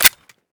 vector click, weapons

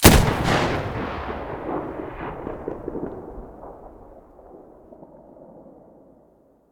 muzzle M700 mono, weapons

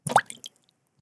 shell 39mm water; STALKER; IWP

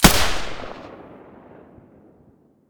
silencer g36 stereo, weapons

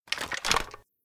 aa12 holster, weapons; STALKER; IWP

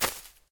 bolt grass, material; STALKER; IWP

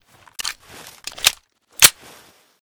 snag reload empty, weapons; fort

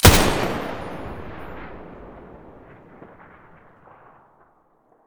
muzzle m249 stereo, weapons

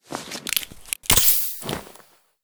stimulator use; STALKER; IWP; item usage

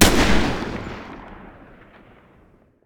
deagle muzzle mono distant, weapons

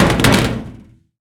pistol drop metal thin; STALKER; IWP

